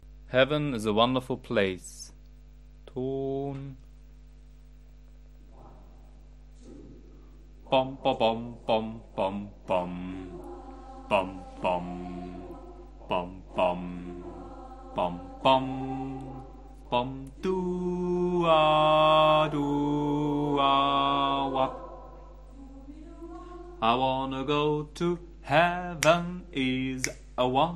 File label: Bass